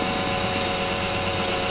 panel move; Star Wars DroidWorks

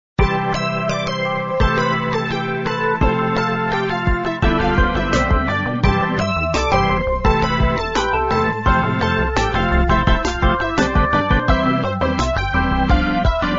music; magic heaven

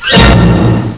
JackPot; Crystal Caliburn™ DEMO

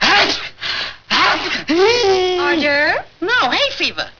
Sneeze; Curly

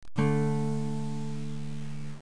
kbd; Music Toys; kbot

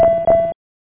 Mines

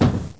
soft impact; Ferazel's Wand

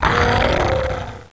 WOR DTH; Renaissance

